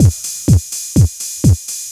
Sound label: loop 125bpm, House Drum Loops